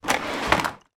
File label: toy plastic bathroom toy box drag across hard floor